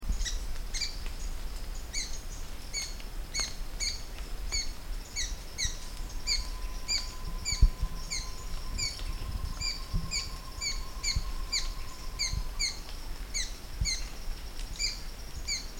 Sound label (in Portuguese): canto do papa moscas de olheiras